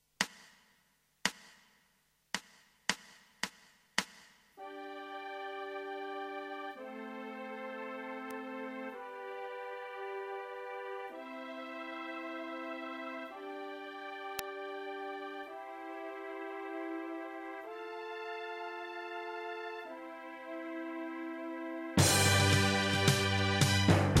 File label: Guitar backing